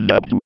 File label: gurp